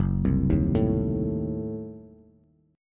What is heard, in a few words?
Gb Diminished 7th Bass; arpeggiated; JGuitar